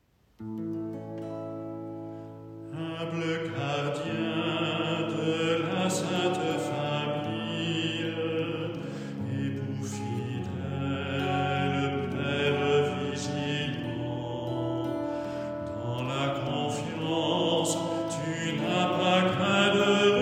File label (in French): humble gardien chant